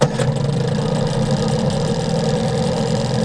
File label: 10 HORSE STRT, navi; trasporti